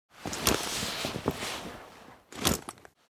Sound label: Military uniform cloth movement; Military Equipment